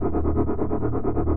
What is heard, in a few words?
idle, weapons